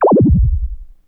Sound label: globe bounce; 3DO